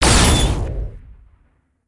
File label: Energy Ball Impact; weapons; aronwk collection; ndaudio